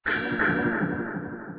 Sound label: hit; WildBlood; betterbeholder; end additions